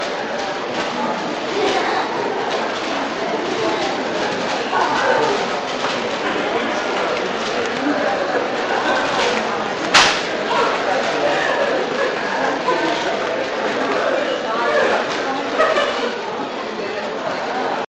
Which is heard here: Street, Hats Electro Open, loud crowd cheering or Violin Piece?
Street